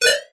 negative game sound fx arcade casino kids mobile app